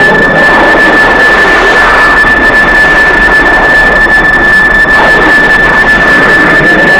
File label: shell whiz